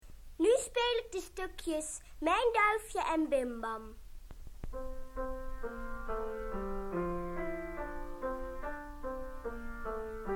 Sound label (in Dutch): piano; muziek cassettebandje liedjes